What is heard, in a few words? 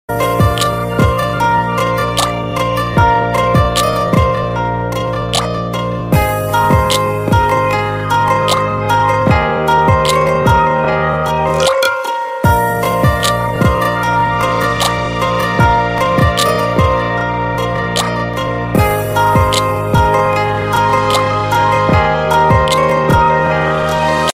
instrumental song ringtone